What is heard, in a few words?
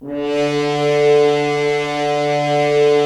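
Solo French Horn, brass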